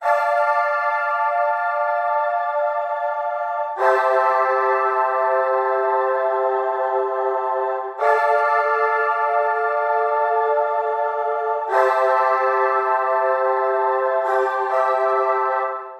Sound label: pad bypass